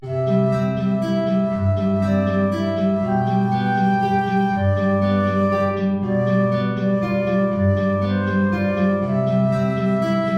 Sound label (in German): Querflöte & Gitarre, hoch; Süßer die Glocken nie klingen